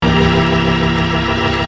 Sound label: cheeze minor, Organs